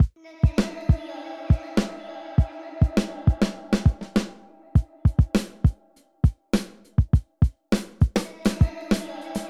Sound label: DRUM